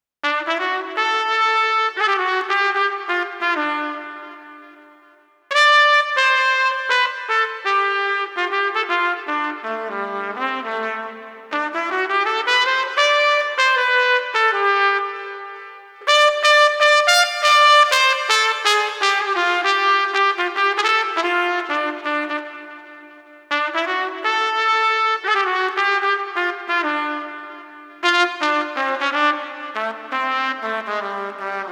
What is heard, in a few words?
Street Trumpet Dignified 80